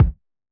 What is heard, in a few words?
Hiphop kick; Reverb+EQ+Comp+Limit